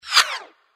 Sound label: Sharp Flyby Whiz; Infernal Radiation; macos